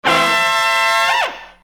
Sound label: Horn Hit, Hip-Hop; Construction Kit; Sonic Foundry (Sony Creative Software)